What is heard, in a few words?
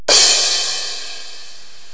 crash